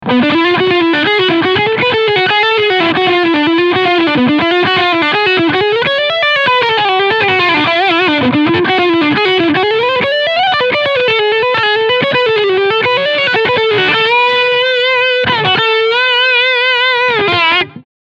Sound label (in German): Strawberry Red DLX Medium Gain Mid OFF Bass 5 Treble 3 Uhr; One Control